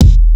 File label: KIK